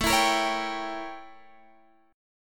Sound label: A 7th Flat 9th; A7b9; strummed